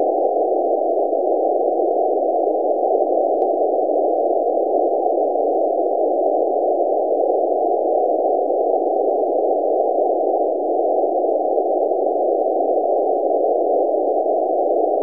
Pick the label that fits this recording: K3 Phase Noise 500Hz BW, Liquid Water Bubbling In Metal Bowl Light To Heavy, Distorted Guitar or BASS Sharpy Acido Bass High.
K3 Phase Noise 500Hz BW